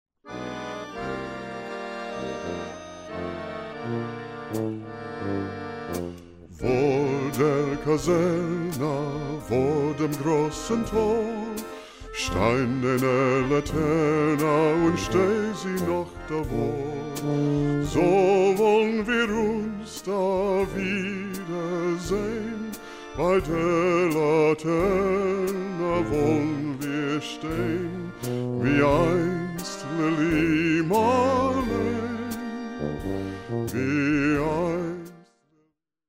jazz band

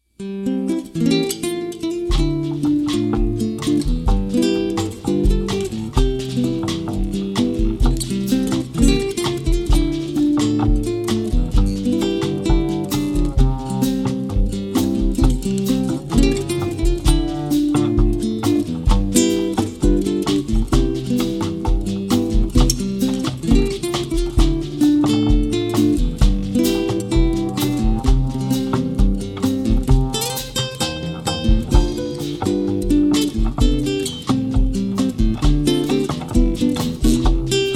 Jazz Trio

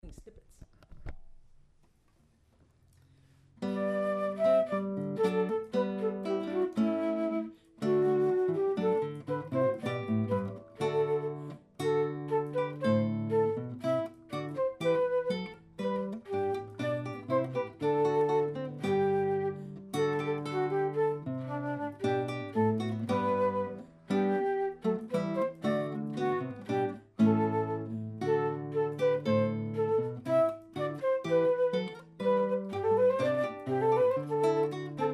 Renaissance English Song flute guitar; Classical Duo

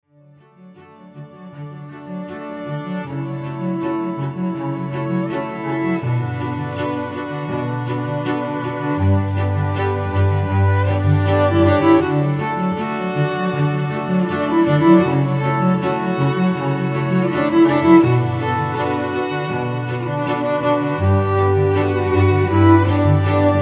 live looping, cello

